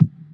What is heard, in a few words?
Kick